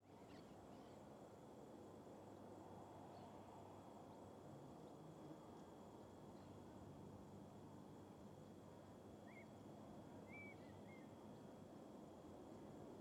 Ambiente em Estrada de Chao Proxima a Rodovia de Alto Paraiso; com Muito Transito e P

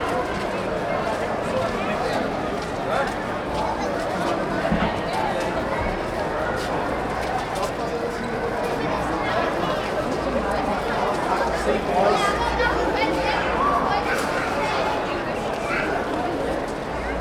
Saida de estadio vozes de crianças; multidao; Colecao Sonora do Cerrado

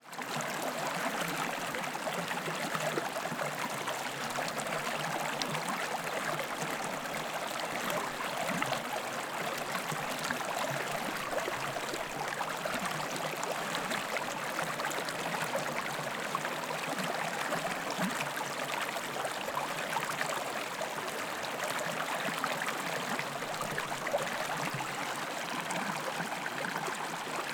Agua correndo entre pedras Rio corredeira

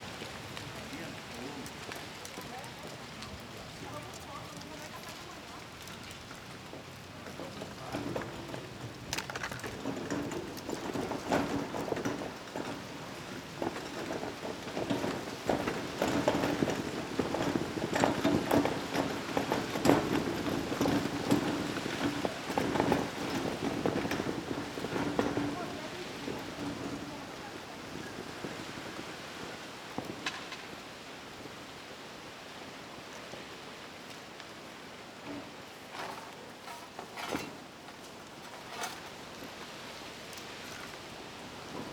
Lixeira com rodinhas com objetos dentro na praca, vento, folhas correndo; equipamentos

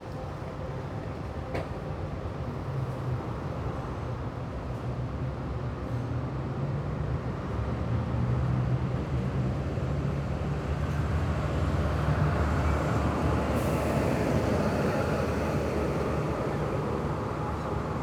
Praca civica perto da rua rush inicio da noite, onibus passando; onibus passando, pessoa varrendo a r

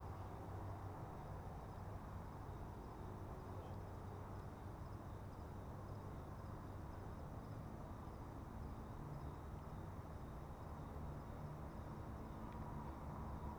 Transito bem longe rua noroeste a noite com grilos; Coleção Sonora do Cerrado

